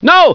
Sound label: awww, hostage reactions; czero opforce